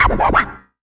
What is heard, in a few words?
Yamaha CS1x Drums